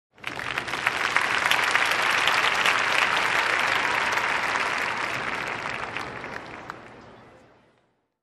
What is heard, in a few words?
Audience in an open field applause